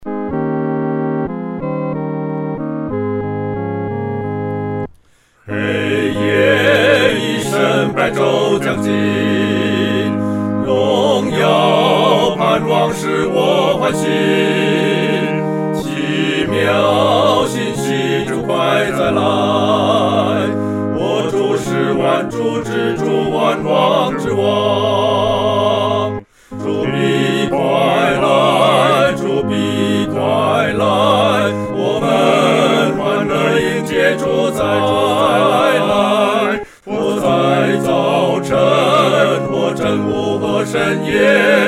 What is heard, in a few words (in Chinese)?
合唱 四声部; 主必快来